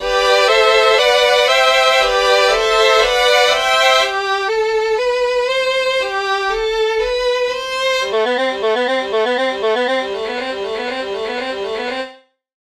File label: Violin